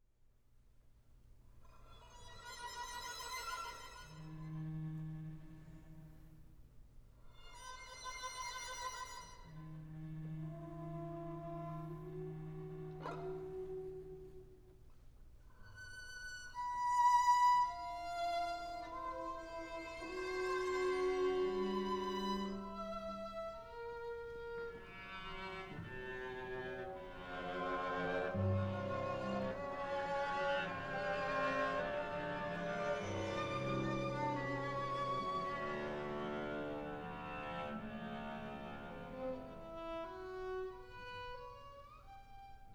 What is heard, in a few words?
five pieces for string quartet sehr langsam